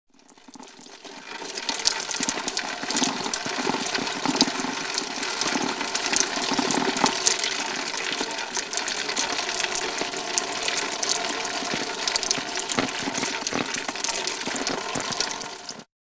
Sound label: Rain; Heavy, Cu Rain Splats On Plastic Or Metal Surface.